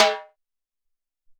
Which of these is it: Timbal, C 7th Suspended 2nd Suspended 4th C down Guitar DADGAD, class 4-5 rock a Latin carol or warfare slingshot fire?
Timbal